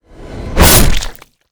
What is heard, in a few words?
boulder crab atk, monster